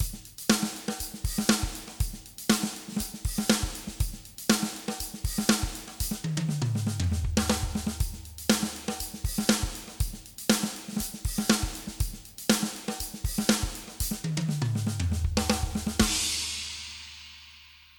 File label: drums rs127 box 10khz 6db; softube abbey road studios brilliance pack